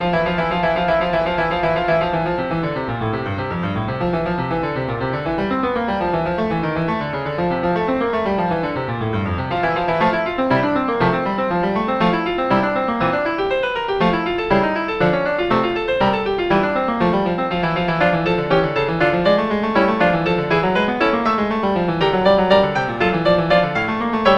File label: Piano Two-hands